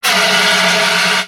Fire Extinguisher; Daredevil

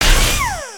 Impact Wrench

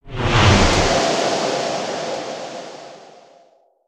Flyby Clean

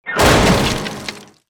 Piano Drop; weapons; aronwk collection; ndaudio

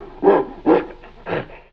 Dragon Wimper

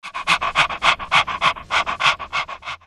Pet Doberman Panting Eat; creatures pets

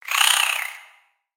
Spider Idle Bored; creatures mechanical; Lego

